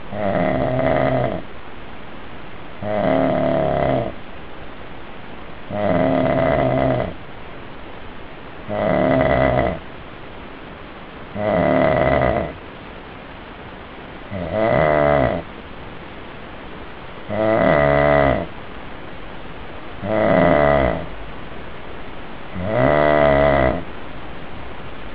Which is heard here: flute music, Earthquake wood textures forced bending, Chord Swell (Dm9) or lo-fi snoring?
lo-fi snoring